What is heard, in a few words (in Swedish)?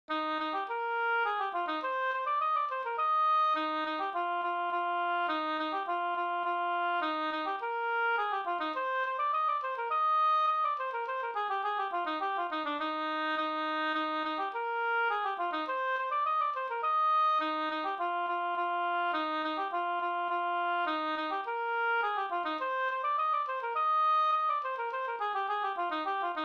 slangpolska